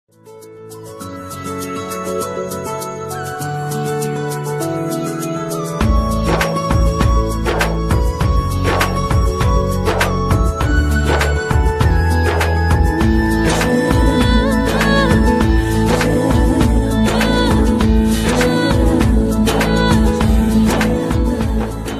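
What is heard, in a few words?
bengali instrumental ringtones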